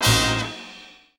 Combo Oldman Hit; Restaurant

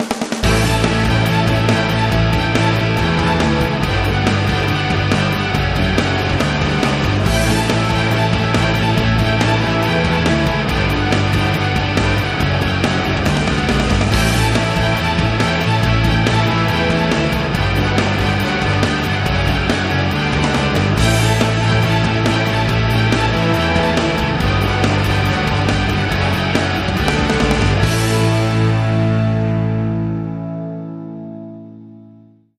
Electric Rock Opus

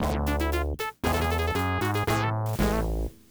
Defeat; tokimeki memorial forever with you; ibm pc at

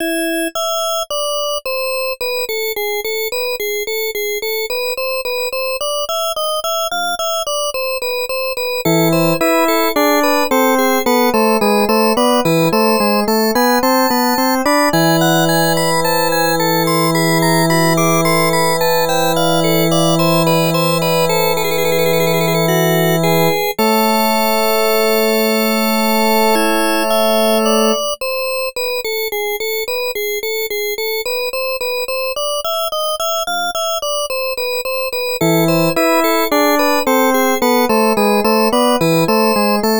Church; Taikou Risshiden; FM Towns

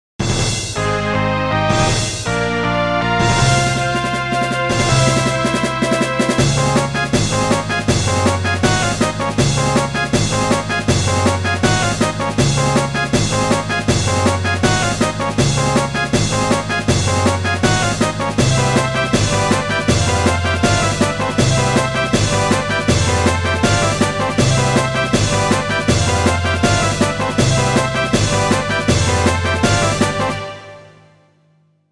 music gameclear; Dangeon Quest; android game music

